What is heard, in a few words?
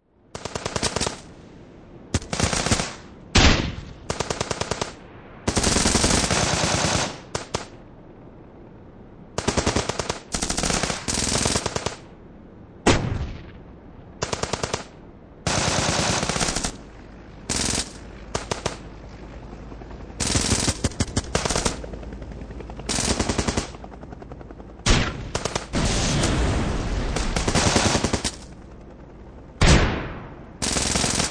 Warfare Modern War Battlefield City Fight Vehicles Helicopters